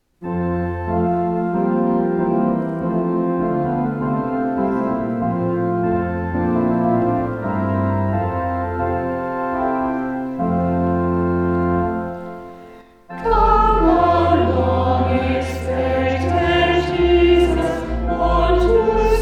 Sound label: come thou long expected jesus reverb